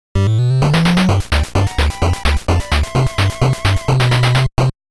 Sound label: Clear Bonus; Pizza Pop; nes